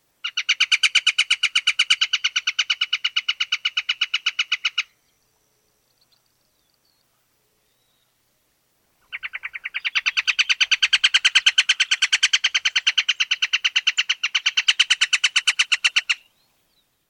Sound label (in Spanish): Martin gigante neotropical Megaceryle torquata torquata